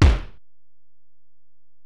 Ac BD, Alesis DM-PRO; 300 Drum Machines

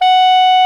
SOP SAX F#5; Greytsounds VOL-1

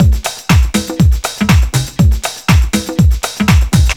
arrowhead, Deep House 120bpm

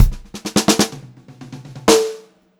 126 POP, Pop-Rock Drumloops; 126 POP 4

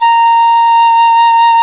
PICC; Roland - LA Composer vol. 1; WOODWINDS

